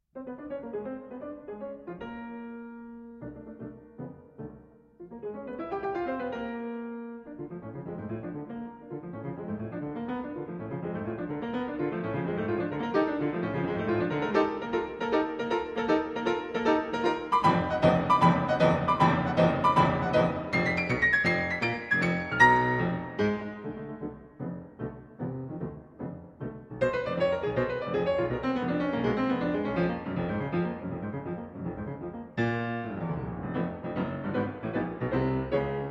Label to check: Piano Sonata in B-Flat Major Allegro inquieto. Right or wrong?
Right